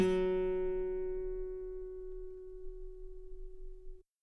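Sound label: guitar F sharp 4 very long forte normal; bananenkeyboard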